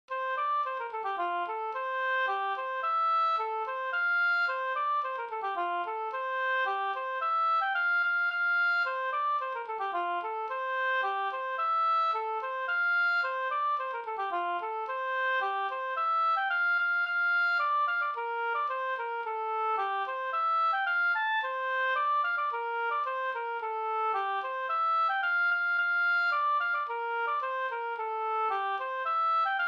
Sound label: polka pariserpolka